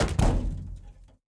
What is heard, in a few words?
PickUp Metal Heavy; Going Under